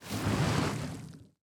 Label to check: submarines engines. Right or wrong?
Right